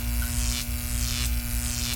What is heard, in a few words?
trap laser atk, monster